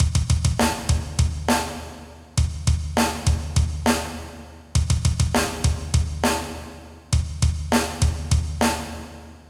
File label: Drum Loop; acid loops; Wiretripn